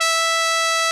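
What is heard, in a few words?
trumpet, cheese trumpet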